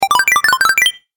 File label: Health Booster, video game